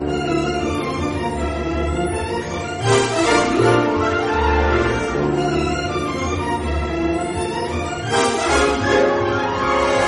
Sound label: Train Music